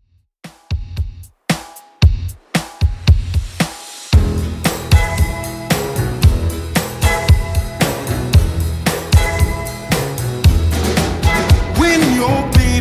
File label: 60s Redrum 114bpm